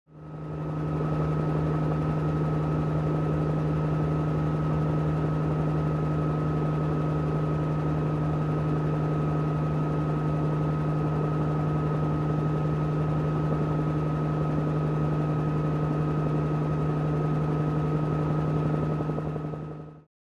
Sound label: Helicopter Interior, bell 47